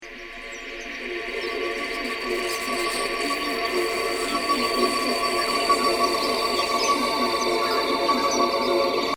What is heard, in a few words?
expression drone